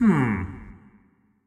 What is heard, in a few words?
Grunt; Marv